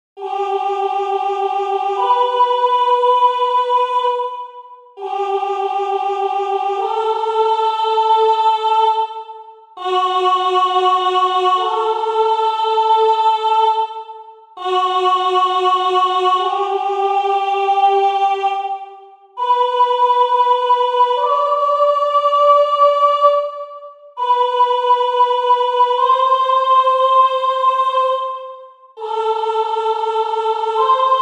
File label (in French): soprano